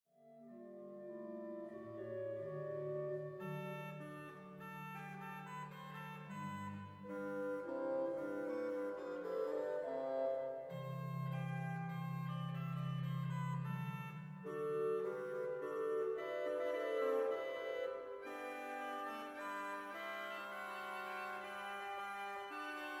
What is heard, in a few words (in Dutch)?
Hinsz-orgel klankvoorbeeld; Appingedam